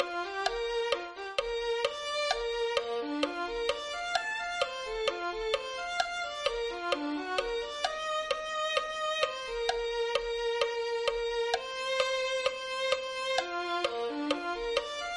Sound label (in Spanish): violin2